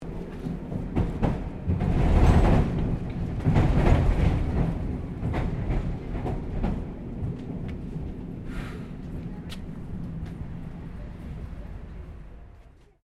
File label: Tram Melbourne W Class Crossing Points Stopping; Streetcar; Streetcars